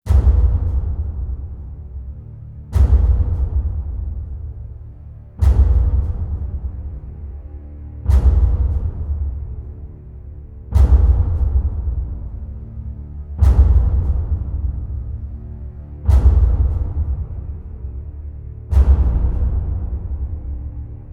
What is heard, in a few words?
Drum & Drone; трейлерная музыка